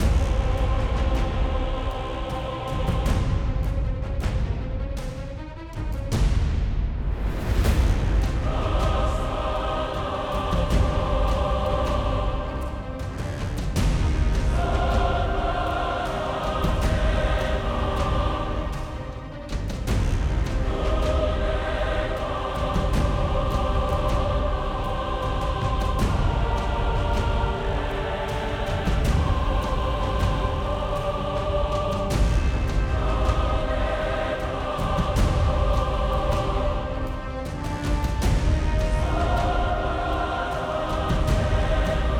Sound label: Epic Battle; Eon Altar